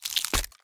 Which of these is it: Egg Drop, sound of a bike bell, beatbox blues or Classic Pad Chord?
Egg Drop